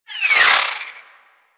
Warfan Projectiles Whizzby; Warframe; Tenno; Broken Frame

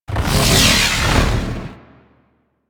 storm cast; Warframe; Warframes; Ninja

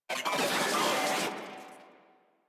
Moa Vocal Death; Enemies; Warframe

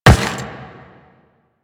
Run Rock, Footsteps; Warframe; The New War; Kahl; Quests

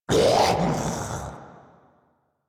Pain; Grineer Ghoul Devourer

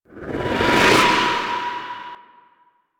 Queen Blast Charge; Ambience; Queens Chamber; Warframe; The War Within

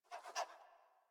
Puppy Idle Pant Short; Warframe; Kubrow

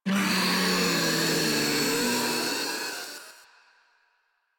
Infested Ancient Death; Warframe; Proto Infested

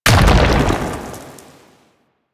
Arm Proj By Explosion; Warframe; Man In The Wall

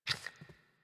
Puppy Idle Snort; Warframe; Kubrow

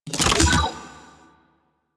Artifact Pickup; Missions; Sentient Defend

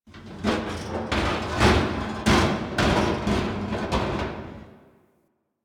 Destruction Metal Scrapes; Ambience; Warframe; Corpus Railjack

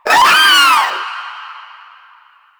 Vocal Short Attack; Warframe; Proto Infested; Runner

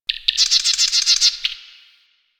Wren Bird; Warframe; The New War; Zariman; Apartment

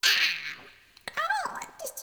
Sprout Vocal Surprised; Friendly; Sproutfolk; Fey Hub Sprout

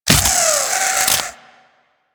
Embed Burrow; Uzi; Warframe; Infested

